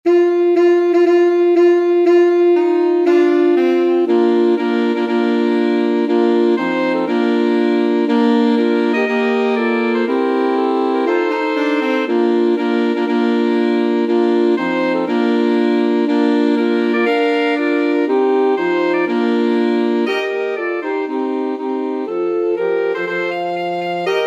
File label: wagner wedding march, alto sax quartet